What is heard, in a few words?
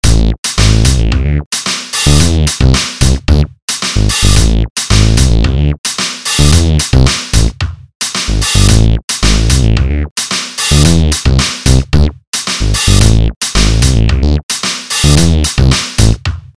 micromono megabass, synthesizers